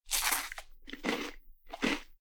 Eat fried food; anemoi

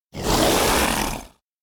beast roar; anemoi

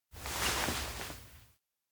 head move; anemoi

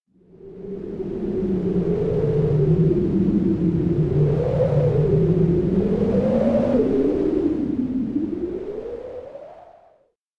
elec wind low growl; anemoi

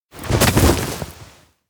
grassland fall; anemoi

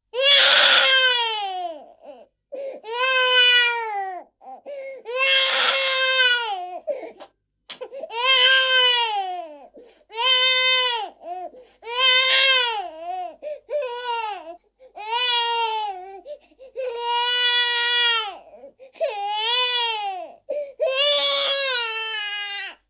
baby voice; anemoi

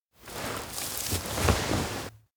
crouch hug; koyomi; anemoi パッケージ版

